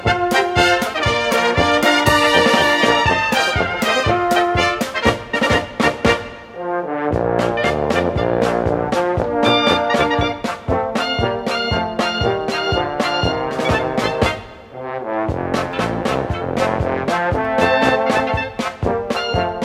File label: Stopp Polka